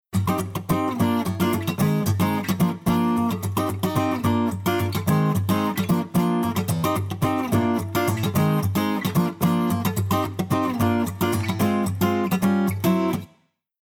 Gt Back Pan

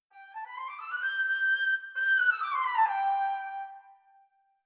Fl scale

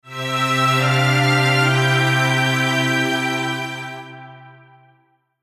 Strings Poly Divisi

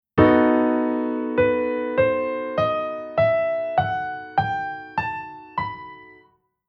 Chord Chord Scale Sec Dominant

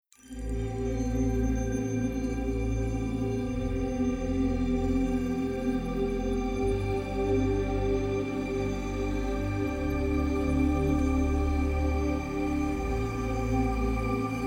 Future Bass Chord Pad

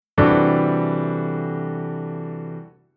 Chord Compound

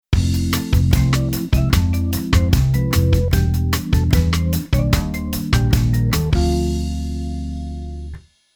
Keyboard Organ Bossa

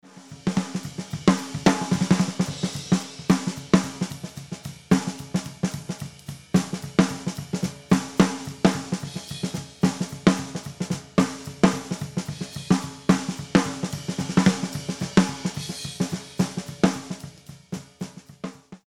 SD Off

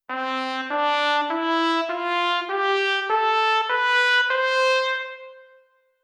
tonguing

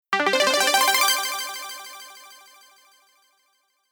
Delay After

